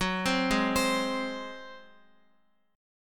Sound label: F sharp Suspended 2nd Flat 5th, Guitar; arpeggiated; JGuitar